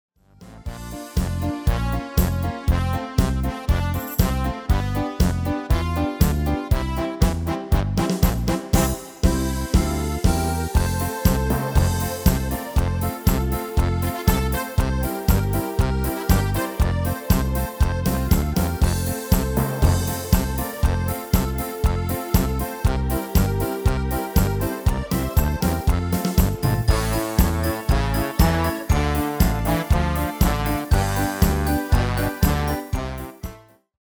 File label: MIDI